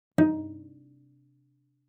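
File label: Cello pizz fortissimo sul D Eb4; MIS Pitches - 2014; Strings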